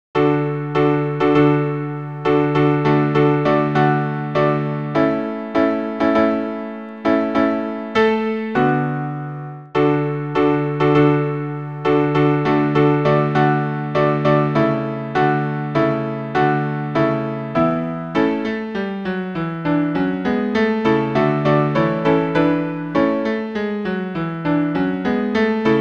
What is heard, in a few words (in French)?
tous pian